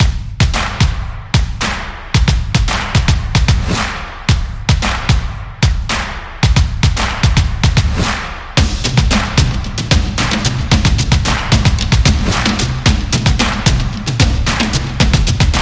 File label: Drum Beat Opener